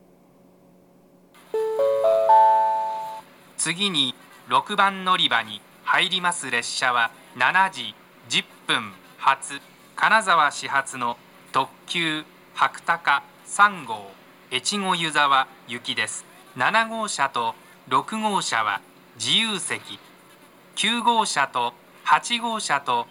kanazawa limited express; echigo-yuzawa; jr-west

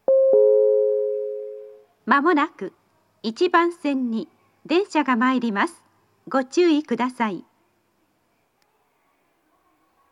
kencho-mae, yui rail station

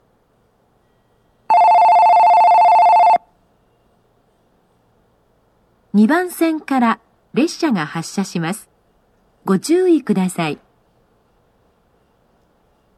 otobashi, station; jr central

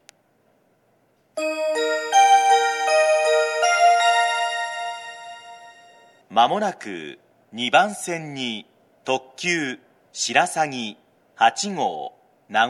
limited express, station; jr central; owari ichinomiya; shirasagi8

